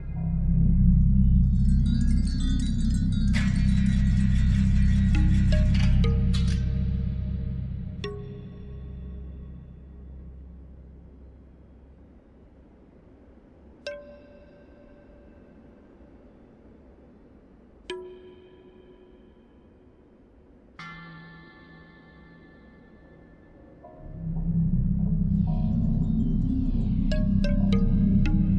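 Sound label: ambience rebuild wind Monuments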